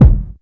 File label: good steel kick drum sound f key; kick drum samples